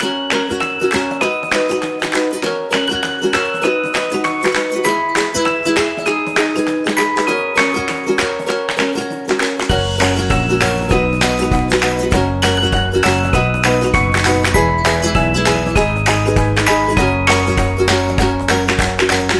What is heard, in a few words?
Commercial Folk; happy music vol 8